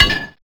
WRENCH

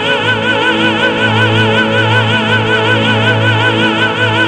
Tenor; puss soundtrack

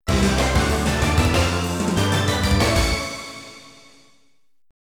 The Winner (Win); Avengers in Galactic Storm; deco mlc system; arcade

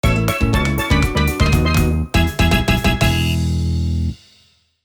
Opening Jingle; Yoshi's Tropical Island

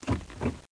Catapult Crank; Learn to Fly 3

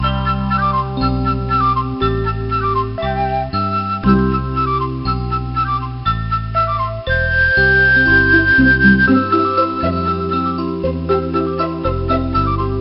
Eskimo Music; TouchMaster 4 Connect; nds

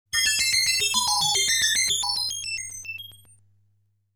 Sound of Bubbles

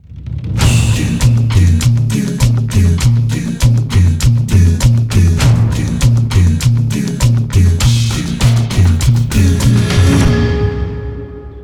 Course Intro Fanfare; crash bandicoot series sound box; Fossil Fuel Injection

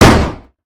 Shotgun Blast; Lethal Company; Windows

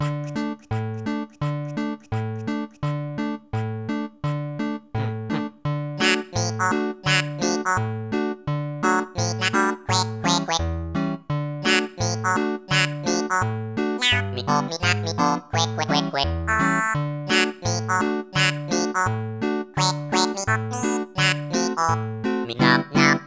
Ragtime, Live; animal crossing let's go to the city

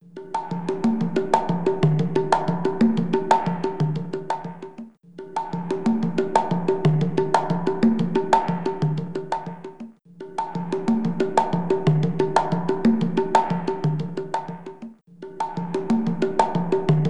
Drumming

